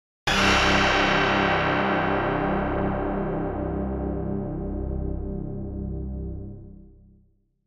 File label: laser harp